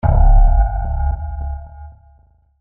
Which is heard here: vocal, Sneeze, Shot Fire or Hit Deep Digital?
Hit Deep Digital